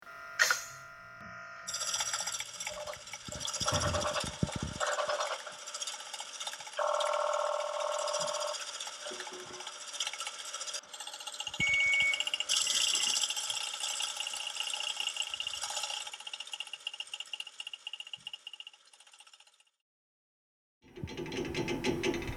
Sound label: mill lab sounds